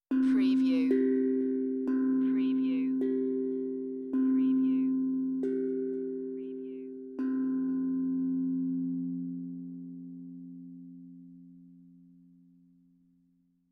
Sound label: Tongue Drum Meditative Tone; beanstalk audio